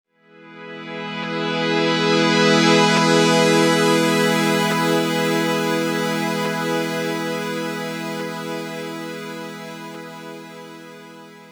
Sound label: NRG Pad